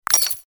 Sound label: buy